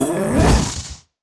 Blue Light Attack; netent

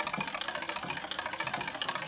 Spin test; netent

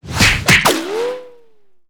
frespin win small; netent

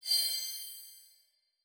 bet Slip Shine; netent

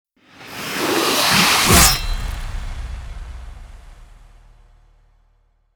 multiplier Strike; conan; netent

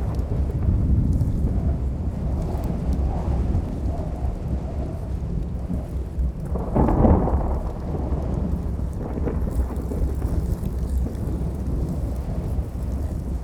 free Spin Picker Amb No Music; netent

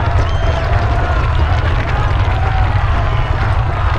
re Spin Rumble Loop; netent

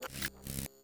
win display

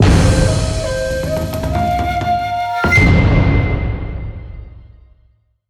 large Win; netent

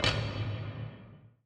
reel Bounce; netent

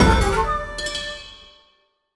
win small; netent

